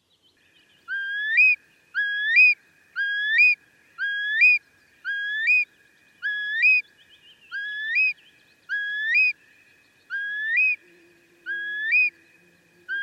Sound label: call breeding; kuusamo fi; a.celmins